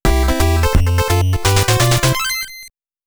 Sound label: Pattern Clear BGM; konami famicom chronicle vol.3 rom cassette edition